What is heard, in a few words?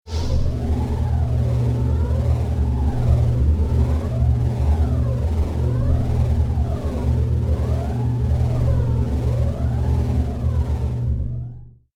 Force field manipulation energy field